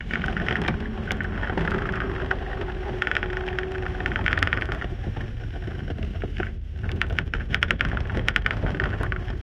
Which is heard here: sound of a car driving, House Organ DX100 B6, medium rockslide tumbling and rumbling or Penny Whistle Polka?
sound of a car driving